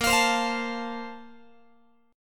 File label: Bb Major 7th Suspended 2nd, Guitar; strummed; x,18,20,22,21,20; 4A,4E,5A,5D,5G#,6C#; BbM7sus2